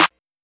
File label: Clap